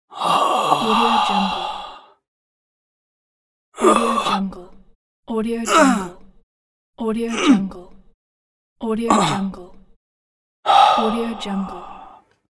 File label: last breath